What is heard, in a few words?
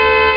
HORN; LFS